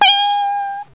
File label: bell